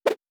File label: Whoosh; Fantasy Sounds Bundle